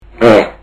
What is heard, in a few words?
fart